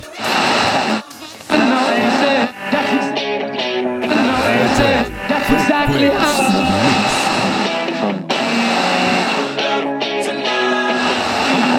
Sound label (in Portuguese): retro